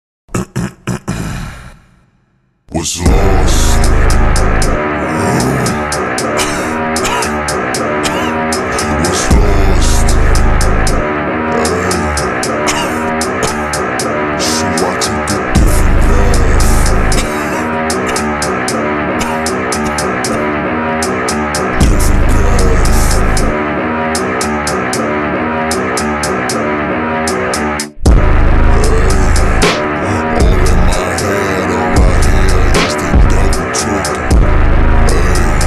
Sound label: slowed reverb